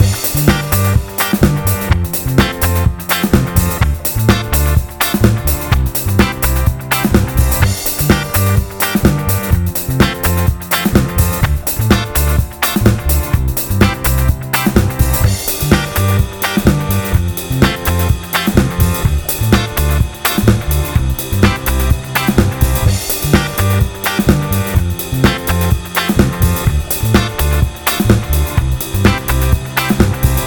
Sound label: Disco Pop Loop